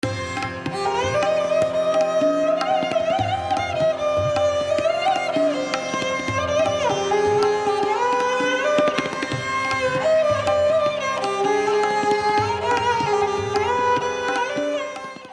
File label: instrument sarangi